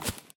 grass step; Golden Crayon Sounds

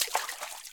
water step; Golden Crayon Sounds

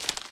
rope step; Golden Crayon Sounds

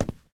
hardwood step; Golden Crayon Sounds

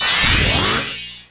Folder Opened; ESAN VO Sound Schemes; ESAN MK Sound Packs

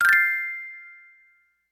hotdice; RS Games Sounds; Farkle

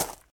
gravel step; Golden Crayon Sounds

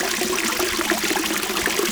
water fountain; Golden Crayon Sounds

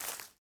weed step; Golden Crayon Sounds

